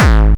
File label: Bassdrums Hard Kicks; Vengeance Samples